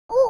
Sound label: crossbills alarm